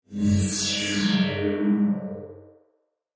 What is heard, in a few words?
Logo High Frequency Electronic Wash with Reverb